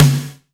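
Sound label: wet snare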